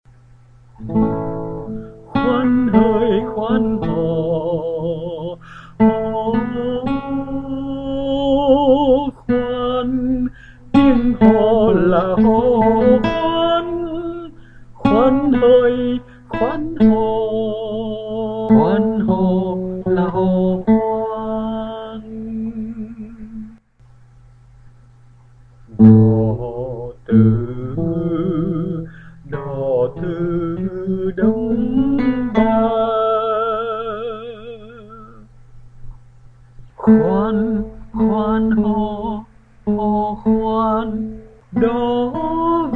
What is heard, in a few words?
Alto